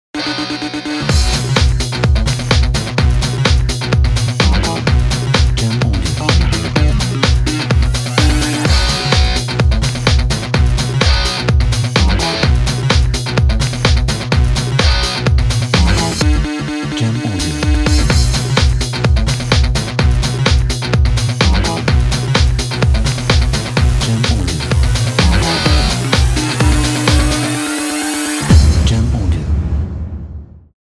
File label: Rock Heavy Powerful